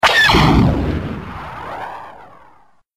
tie fighter blaster sound effect; star wars

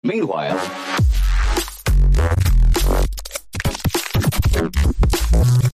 sub

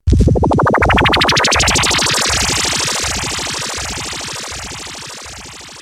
electro wave

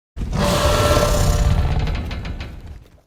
roar small; Alatreon

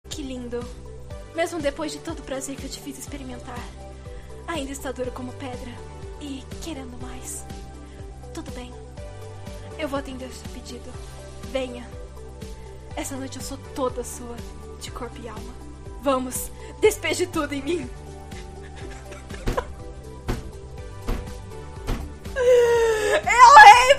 moan

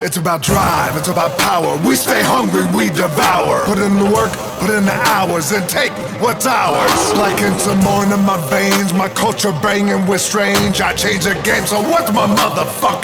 rap; The Rock

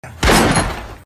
dramatic gunshot

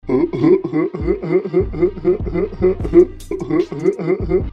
laugh

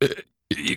belching

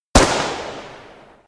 single ak74

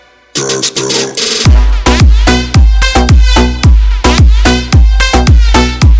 remix

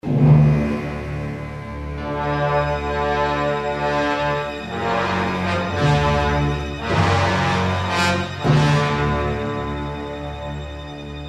dark side music

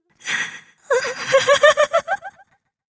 demon laugh

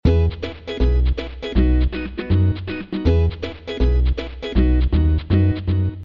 music; spongebob gba

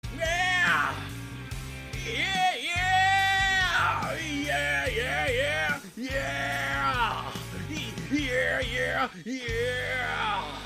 yeeaaahh yeaeahhh

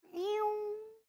eeeeow

